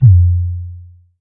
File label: Shield Hit; Apotheorasis; Scientist